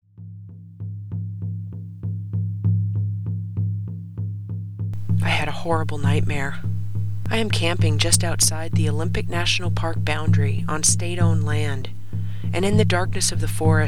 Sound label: nightmare w drum